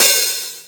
Open Hihat; Cymbals; Mutekki Media; Vengeance Electroshock Vol.2

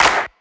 Clap, Dubstep One-Shot Drums

